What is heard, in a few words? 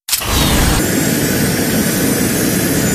jetgun shoot